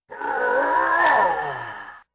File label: Big die; RedDot